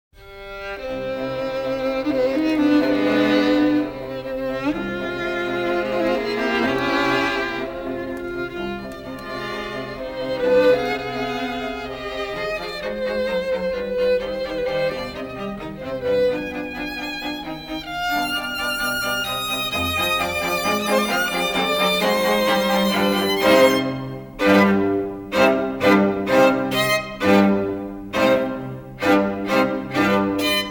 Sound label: beethoven strings; cloudbounce; mastered